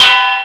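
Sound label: KLLANG, percussion metallic; Rarefaction; Poke in The Ear With A Sharp Stick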